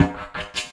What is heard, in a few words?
Didgeridoo Kit Samples; Sonic Foundry (Sony Creative Software); The Electronic Music Manuscript Collection; Battery 3 Sample Kits